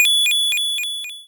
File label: Logo Blink